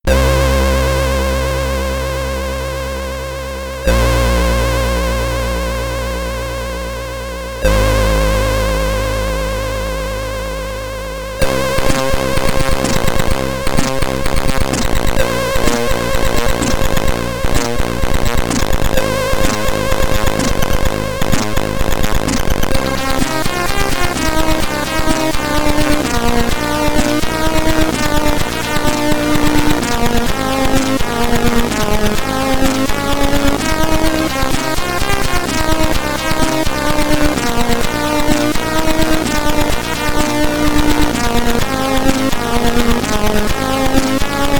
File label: oceanloader 6581r4ar, c64